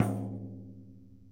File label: Frame w Tamborine, World Percussion One Shots; Sony CS